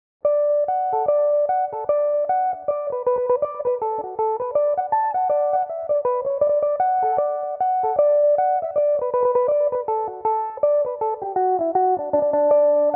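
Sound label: D major, mandolin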